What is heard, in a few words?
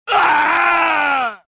death; reborn